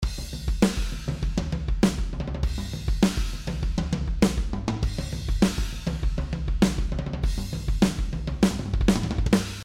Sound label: DRUMS DRY